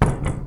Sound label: Furniture